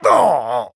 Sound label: Male Dwarf Death